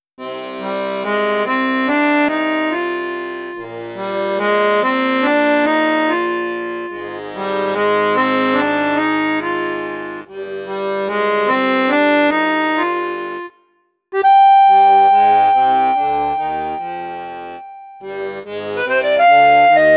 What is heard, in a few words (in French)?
registre basson, accordeon; cavagnolo vedette 10